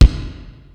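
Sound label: Kick, Yamaha SY22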